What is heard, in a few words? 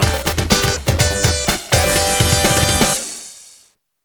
stage clear; sonic arcade